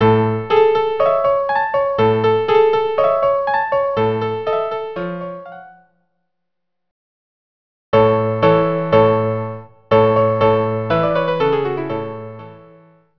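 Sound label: violin piano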